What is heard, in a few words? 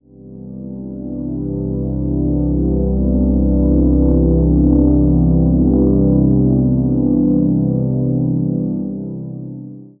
ethereal ambient pad in c